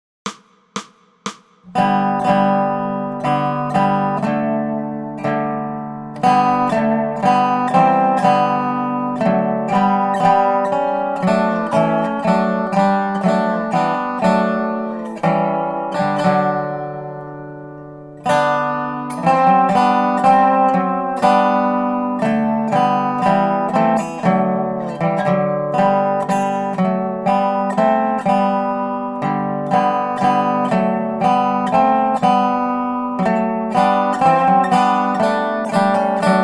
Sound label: Oh Come All Ye Faithful Dobro; christmas